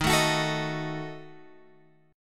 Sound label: D sharp Diminished D sharp Guitar Open G